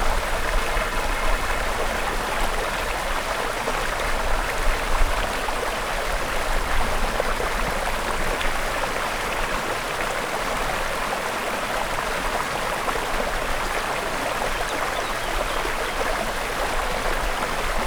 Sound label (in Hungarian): szep hangu, patak; visegrad